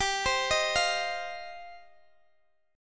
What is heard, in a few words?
G 7th Suspended 4th Sharp 5th G down Guitar DADGAD